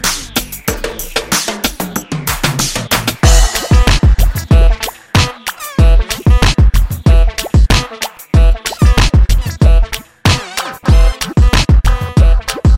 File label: Saxo